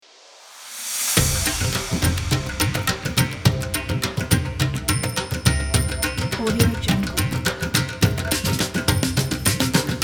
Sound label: indian drums